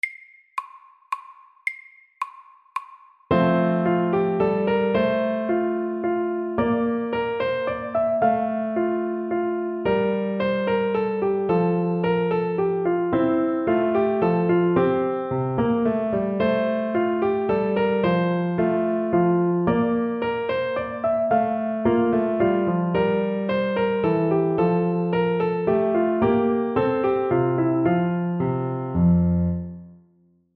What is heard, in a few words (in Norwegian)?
Minuet in G major MIDDELS